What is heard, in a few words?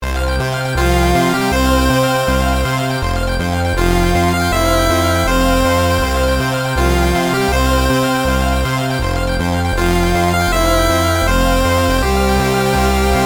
Victory Short; Avalanche 2 Super Avalanche